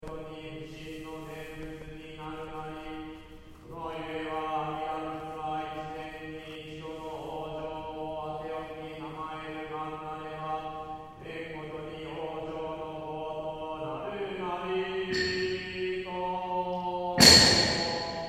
Temple Monk Chanting Tokyo; Monks chanting in a Japanese Buddhist temple, Tokyo Japan; Zinjo Ji; Chanting Sound Effects

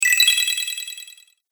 Sparkle Star Game Fx, video game sound effects

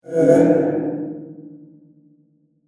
Future Vroom, whoosh sound effect; Future Vroom 12

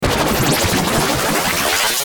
Power Up Digital Sweeper Tonal Radio Transmission; Rise And Dive; Blastwave

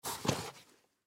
Tennis Shoes Up, Tennis Shoes Pick Up; Sports; Foley; Blastwave

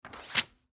Paper Sheet Fold Set Down On Table Contract; Office; Blastwave

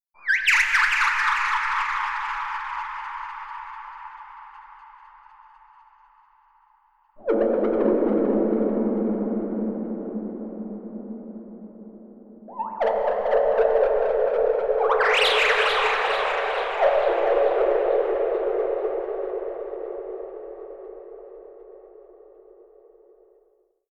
Creature Scary Voices Surreal Ethereal; Airy Horror Scifi Science Fiction; Evil Voices

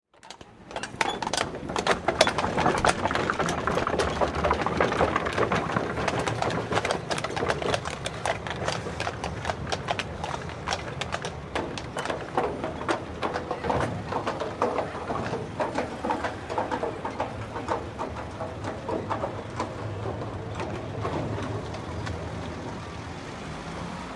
Ambience Europe Streets Carriage; Cities (Sound Effects:Backgrounds)

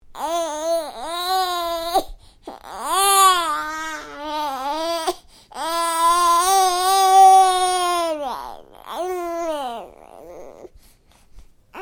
Baby: making vocal noises, upset, nearly crying; Babies; Stuart Duffield

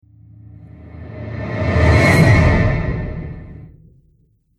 Radio Stinger

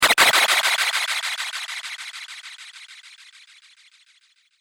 Laser Future Plasma Pistol Echo; Laser Gun Sound Effects; Sci-Fi

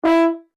HORN E5 F SHORT, French Horn in F; Alexander French Horn in F; Musical

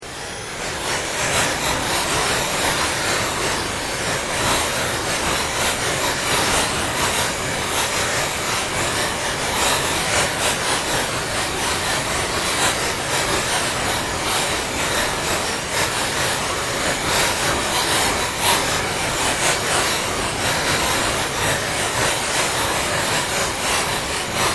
Ambient Drone Ghost Chatter

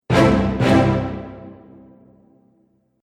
Bold Orchestral Accent; Musical

